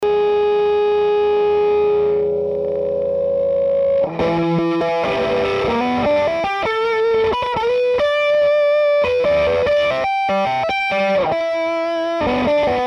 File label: Fuzz